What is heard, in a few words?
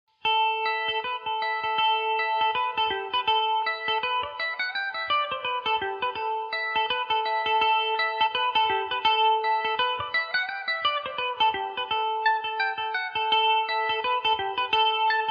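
A Dorian, Irish mandolin; The Gravel Walks; Revelation Jaguar electric mandolin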